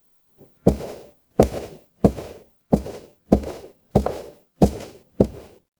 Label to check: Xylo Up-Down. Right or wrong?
Wrong